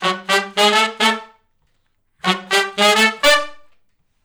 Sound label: Funk Riff (D) unison, Horncraft for R&B; Sections; Crimson Blue and Fabulous Horncraft 4 RnB